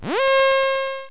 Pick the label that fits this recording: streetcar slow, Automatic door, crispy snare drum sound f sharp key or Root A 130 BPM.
Automatic door